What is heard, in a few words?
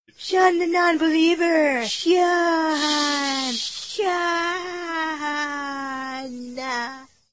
Funk